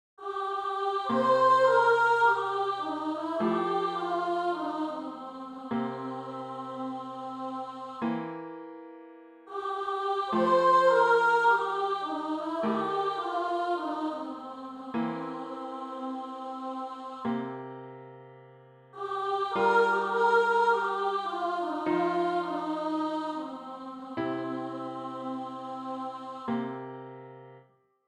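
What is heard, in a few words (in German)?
Hohe Stimme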